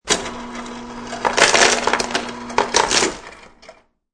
Ice grinding and falling into glass from refrigerator ice maker; Party Sound Effects; Sound Effects:Bars